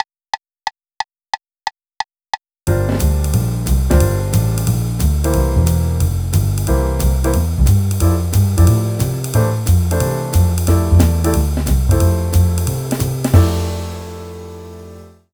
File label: Double length maj 251 SLOW